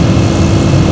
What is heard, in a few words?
fall; alienarena; war